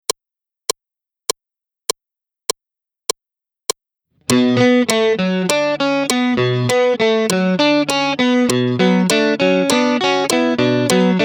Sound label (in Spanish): ejemplo 100 bpm, guitar